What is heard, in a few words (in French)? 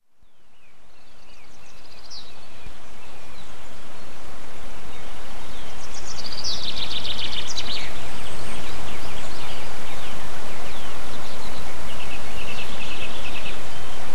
Chants d oiseaux avec pivert; MZ-N710